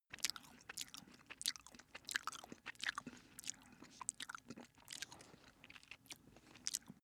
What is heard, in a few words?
cat eat sequence